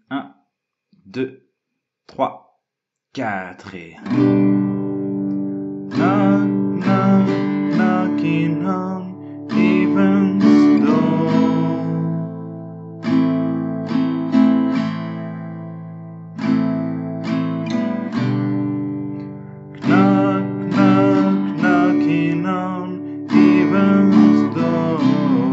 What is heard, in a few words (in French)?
Refrain entier chanté Moyen